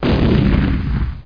explosion

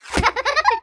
kids hit; Geschicklichkeit; Cupids Match Up

